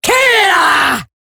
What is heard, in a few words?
death, female; en; Eximus Grineer; Battle Barks